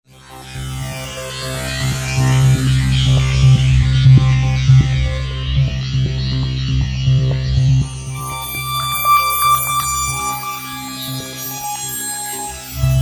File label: Wavescanner, Roland V-Synth; Factory Patches